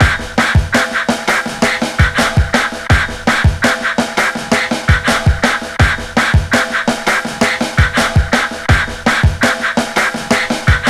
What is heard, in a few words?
WEIRD BEAT, 83 bpm, Breaks; POTBreaks